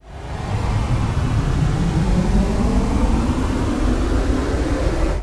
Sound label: engine startup